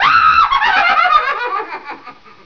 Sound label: witch, haunted; quake; fortress